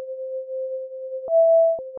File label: melody